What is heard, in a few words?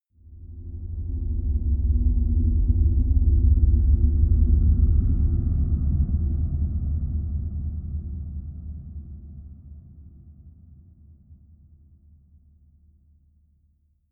low airy rumble, Drones; musikelemente